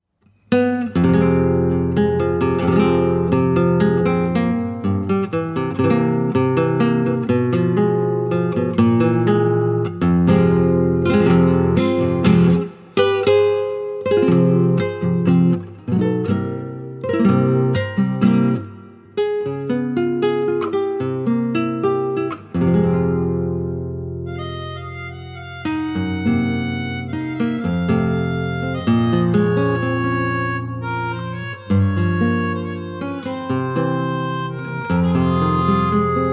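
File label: Fm guitar play reference armonica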